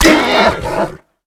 horse hit; Minecraft; Votive World

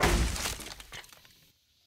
wood break, zombie; mob; Minecraft; Votive World

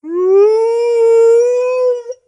howl, wolf; mob; Minecraft; Votive World

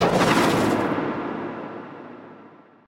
power, beacon; Minecraft; Votive World

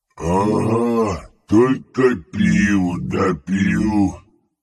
zombie villager say; mob; Minecraft; Votive World

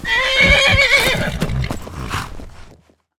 horse angry; mob; Minecraft; Votive World

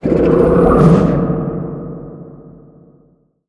scream, ghast; mob; Minecraft; Conquest Default

